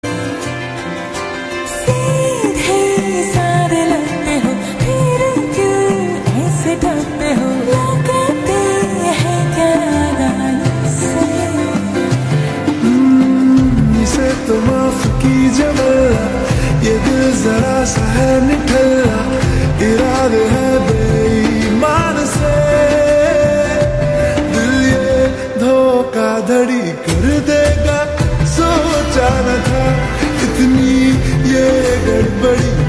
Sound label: Slowed Reverbed